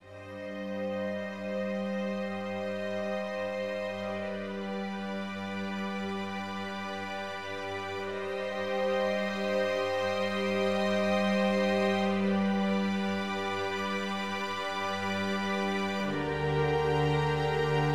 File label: Sorrowful